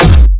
Intense Punch

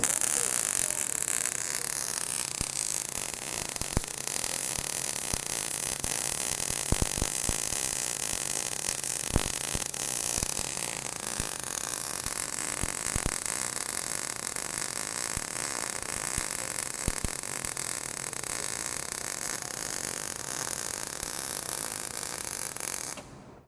Welding

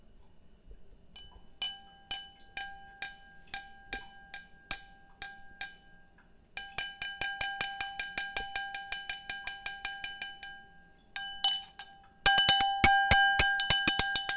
Cheers Sound

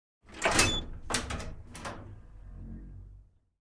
Door Metal Locker Gate Grill Open

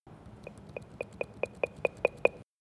Apple Volume Control

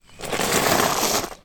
Drag Gravel

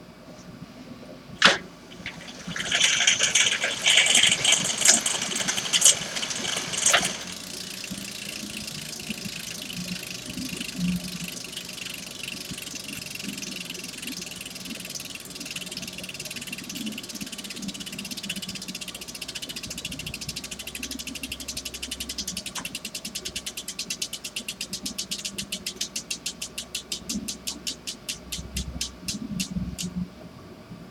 Bicycle Wheel Pedal

